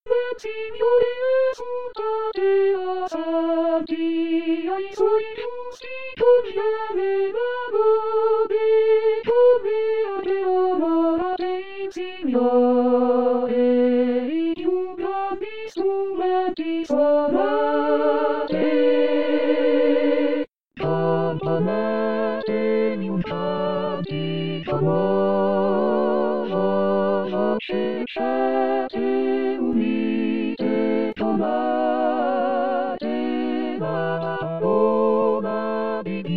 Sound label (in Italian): Nel Signore Esultate Coro